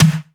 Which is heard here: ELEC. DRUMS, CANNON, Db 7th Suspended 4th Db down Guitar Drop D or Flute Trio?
ELEC. DRUMS